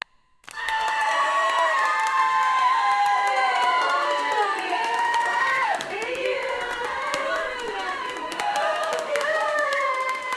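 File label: 36 women applauding in the, small room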